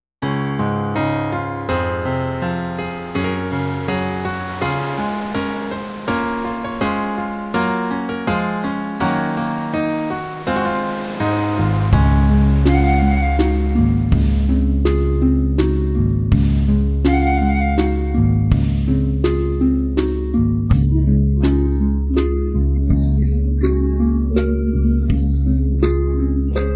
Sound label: music out